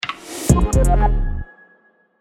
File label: logo